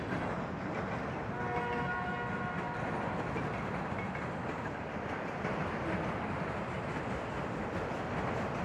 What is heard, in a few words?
train horn